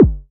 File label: Multi-Kick Short